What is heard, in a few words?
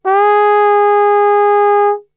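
G#3 trombone gliss, Brass; Best Service; Brass Super Section; TRMB GLISS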